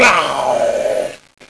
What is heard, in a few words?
fast zombie idle